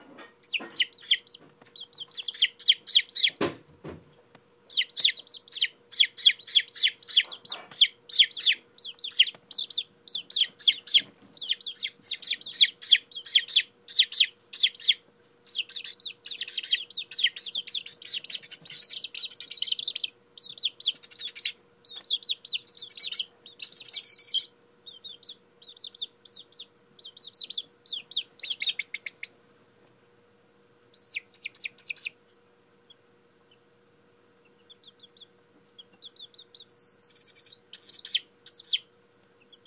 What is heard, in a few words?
chicks kicking off